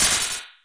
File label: Dash; Mega Man X